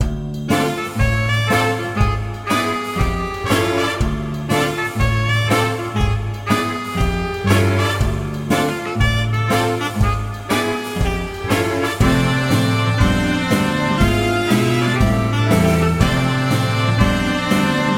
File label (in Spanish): Carrusell